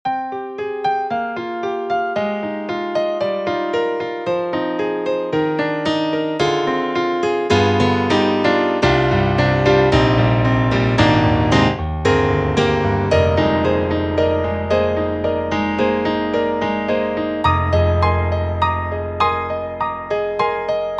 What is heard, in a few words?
Mono Piano; KORG KRONOS 2; OASYS